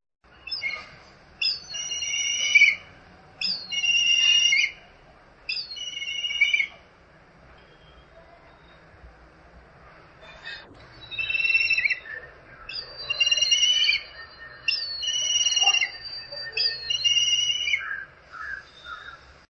black kite; birds

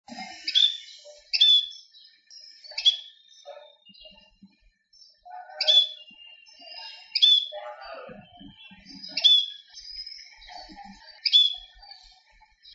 scaly breasted munia, birds

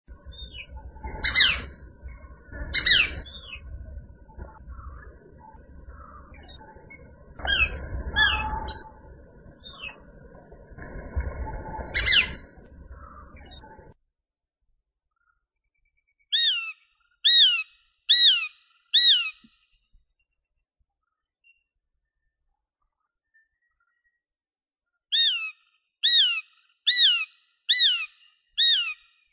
shikra, birds

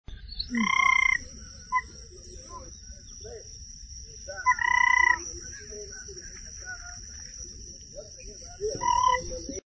pheasant tailed jacana

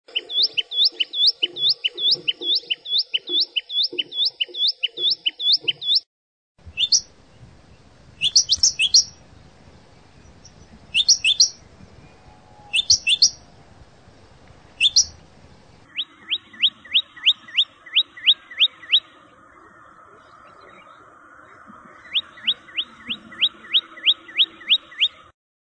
common tailorbird, birds

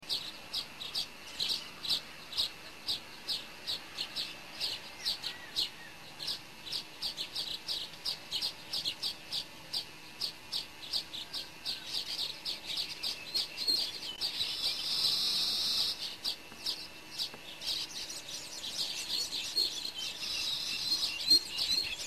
baya weaver; birds

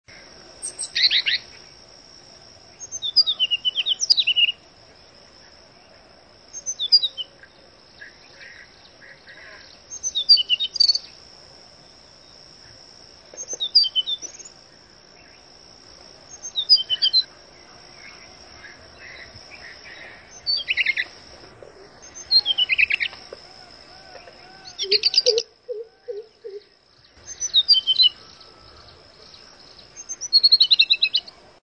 tickells blue flycatcher

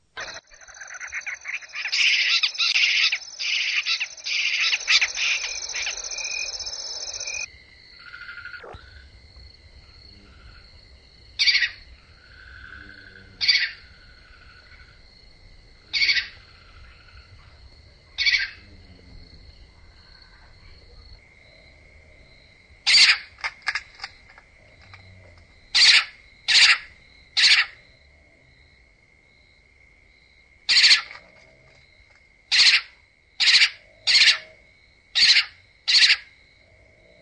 spotted owlet

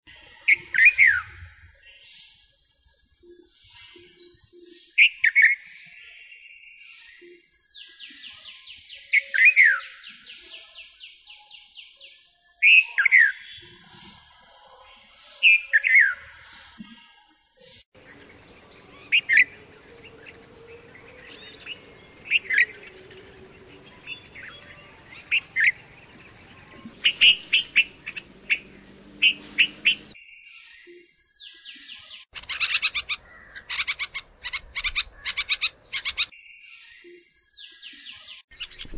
red vented bulbul

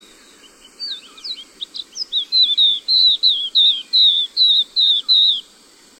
Oriental White eye

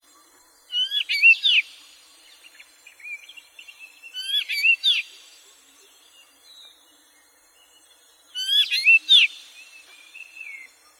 black drongo, birds

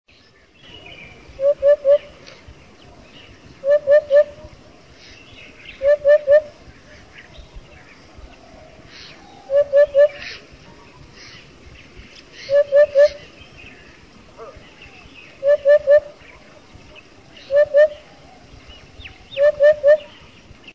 common hoopoe; birds

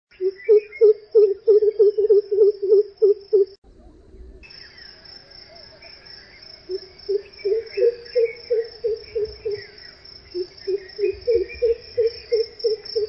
crow pheasant

